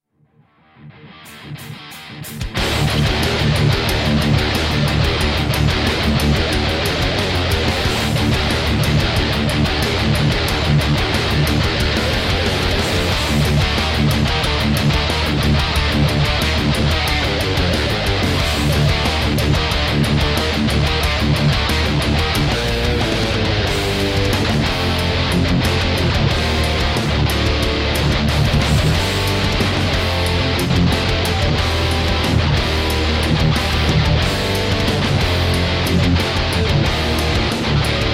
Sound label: Metal